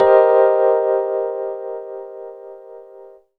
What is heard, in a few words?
Cmin 7th, Pads; Jungle Warfare 1&2